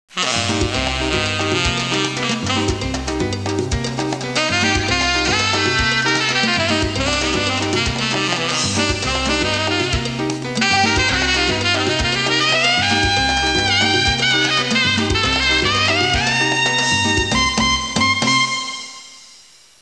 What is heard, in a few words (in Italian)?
musica latina